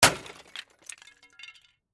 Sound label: Impact Automobile Headlight, Smash, Shatter; Crashes